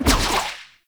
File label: Spider Wire Throw